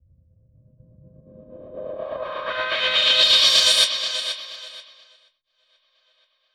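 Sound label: Filter Processed, alarm siren samples 125bpm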